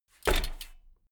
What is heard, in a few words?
bmx bike land impact rattle